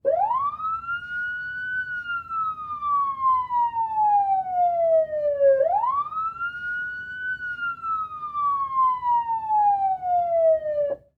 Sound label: Police Car Siren Interior; Auto; Transportation